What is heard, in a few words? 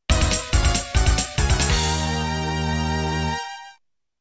music victory; mega man